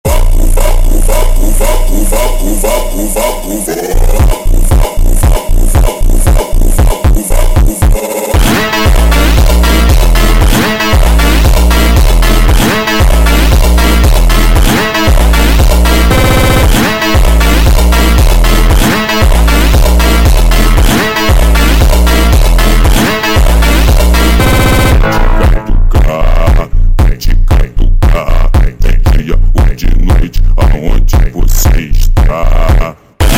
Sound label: slowed Reverb, funk